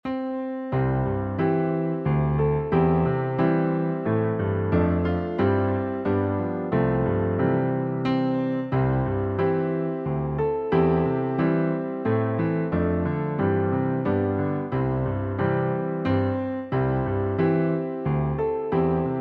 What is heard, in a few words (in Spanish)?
Pista instrumental sin melodia